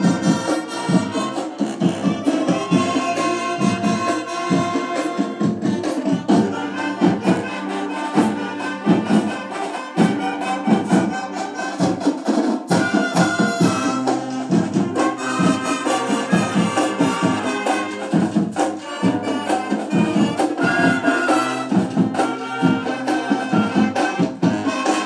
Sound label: marching band playing